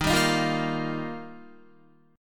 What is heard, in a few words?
Eb Major Add 9th, Guitar; Ebadd9 strummed; 11,10,13,10,10,12; 4E,4A,5D,5G,5C,6F; JGuitar